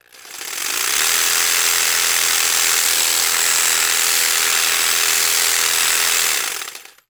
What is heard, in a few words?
Jig Saw; Tools; 1001 Sound Effects